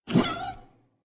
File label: cat